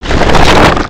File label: rock pull; creatures organic